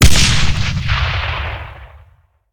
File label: explosion; gusanos promode